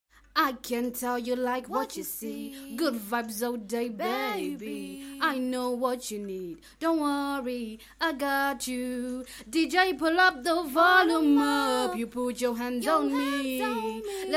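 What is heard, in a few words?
pop 100 E minor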